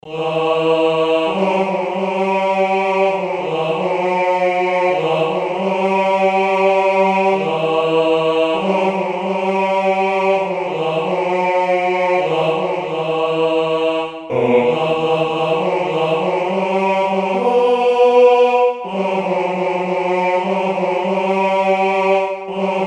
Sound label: alto tenor